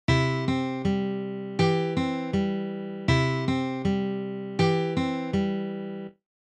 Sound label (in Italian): arpeggi